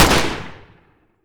ak47 fire; garrysmod

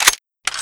m4a1 silencer on, weapons; garrysmod; m4a1 customizable

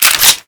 f2000 bolt pull, weapons; garrysmod

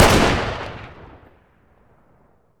m3s90 fire, shotgun; weapons; garrysmod; m3s90p

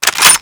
bolt back, m98; garrysmod

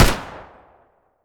cf05 fire; weapons; garrysmod

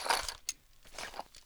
m60 cardboard remove full; mg; garrysmod

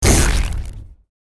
impact; cstrike; war3source; manashield

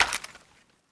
m60 draw; garrysmod

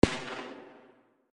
aug, distant sound; garrysmod

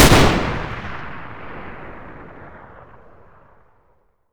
m82 fire, sniper; garrysmod

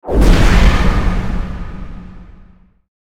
shield break; cstrike; war3source; avernus

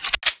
elite right clip in, weapons; garrysmod

